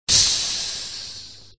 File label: sizzle; c-evo